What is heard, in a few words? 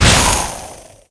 skill explo